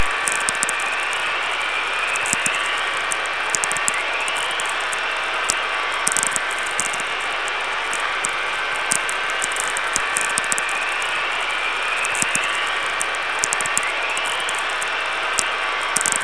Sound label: atmospherics Vlf; Norrskenslandet